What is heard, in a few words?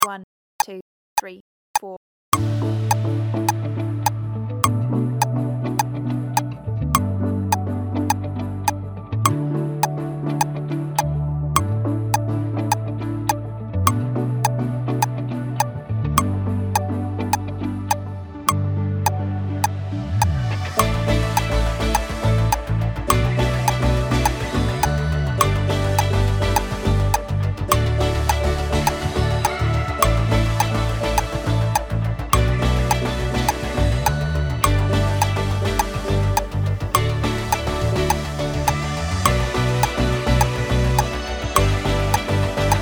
echo drum